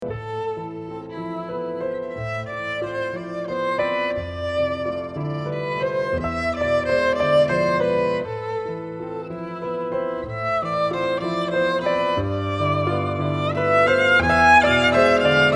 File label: sirena, violin